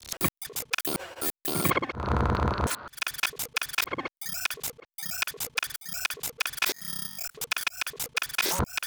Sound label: granulized glitch